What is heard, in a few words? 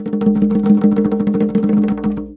arabic perc, Arabic Percussion Kit